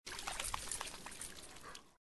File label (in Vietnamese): con cho lac nuoc; ru long